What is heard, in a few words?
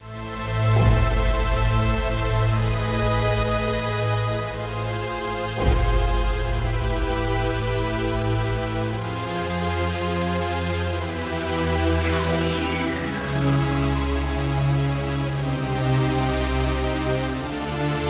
DEFEAT; Tribal Rage